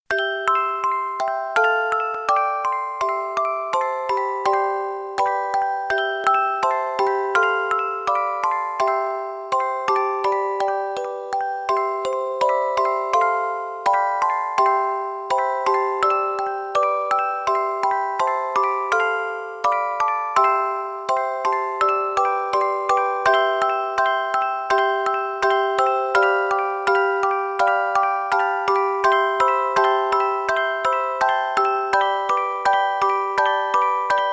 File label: lullaby; lullaby for mice